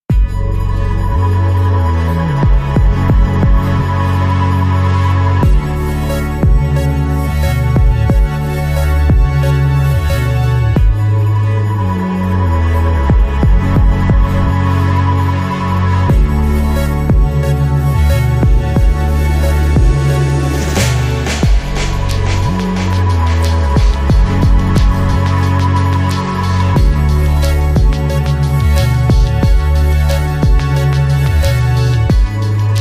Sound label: Low Key